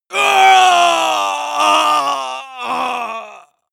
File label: male combat die; schreie; menschen